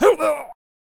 Death Vocals

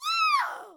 Valkyrie Attack Vocals

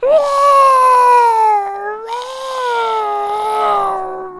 Painter Death Vocals

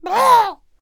Halfling Death Vocals

